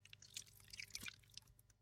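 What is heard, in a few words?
we inject the drug with a press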